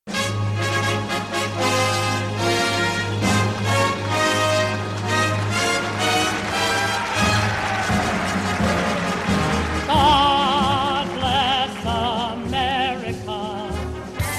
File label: 4TH OF JULY MUSIC MONO